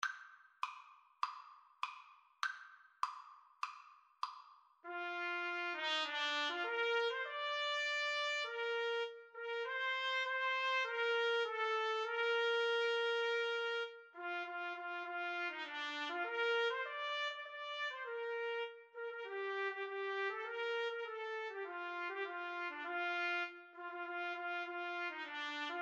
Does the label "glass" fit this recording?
No